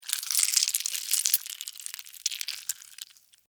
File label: Spider Bite Chew, Animal, Spider, Bite, Chew, Eat, Juicy, Wet, Arachnid, Insect, Bug; Spiders; BlastWave